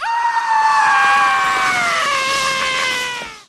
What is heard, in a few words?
LOUD AND LONG SCREAM; scary